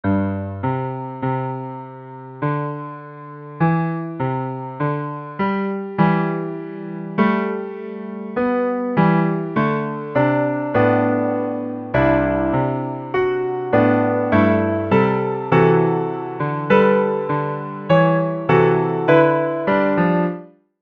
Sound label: fugue